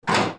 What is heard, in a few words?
cell Door Close; Phasmophobia